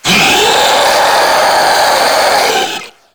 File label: Proto Infested Ancient Heavy Bark, Enemies Vocals; Warframe